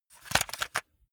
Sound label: cassette rattle short